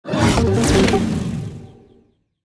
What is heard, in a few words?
Podium Synthwave